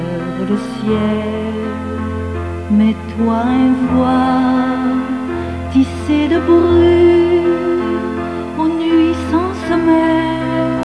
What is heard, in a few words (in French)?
pleure; guerre d'Algérie